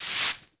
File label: sweep